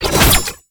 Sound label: Bayonet Melee Combo; TnBayonet; Warframe; Tenno; weapons